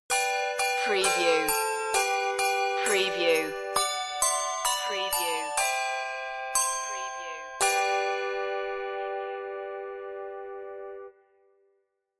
handbells sleigh; good king wenceslas

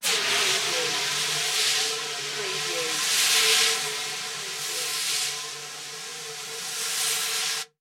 Fire Extinguisher

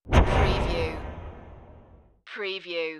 Wipe

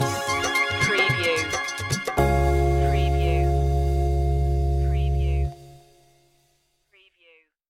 hindu pop stinger

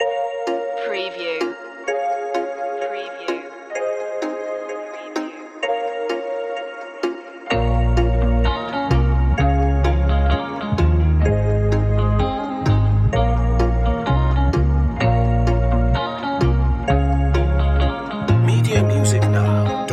sweet memories no drums

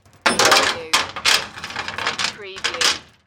Chain Metal Clanging

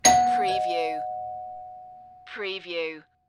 Door Bell

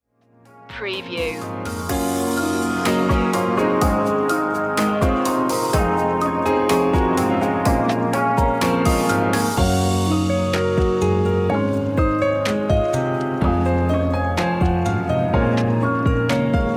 thinking no guitar; media music now

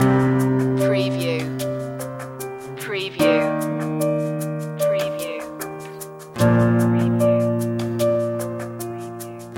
natural flow loop

